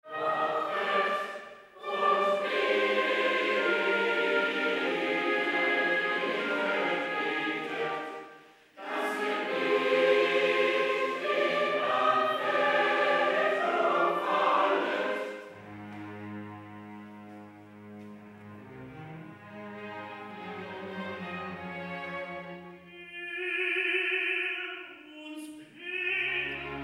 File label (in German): Motette mit Rezitativ Chor aus der Hoehe und Tenor; IV Die Verleugnung